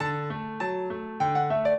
minuet, piano; Mozart

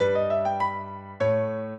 piano; Mozart; minuet

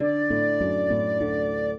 minuet, flute harp; Mozart

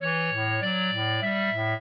clarinet; Mozart

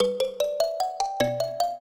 trio, mbira; Mozart

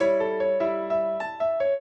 trio, piano; Mozart

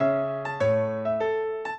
minuet, piano; Mozart

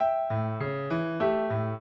piano; Mozart

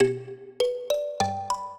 minuet, mbira; Mozart

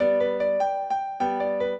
piano; Mozart

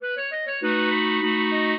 clarinet; Mozart

